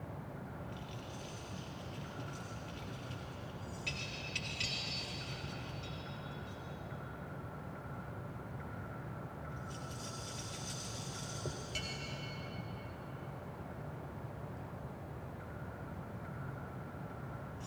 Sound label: ambience arena main loop